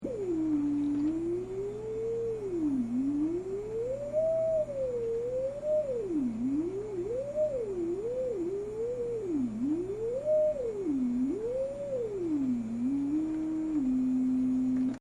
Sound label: fm mod